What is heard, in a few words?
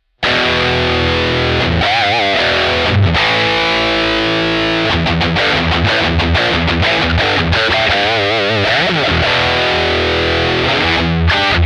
tone v-amp marshall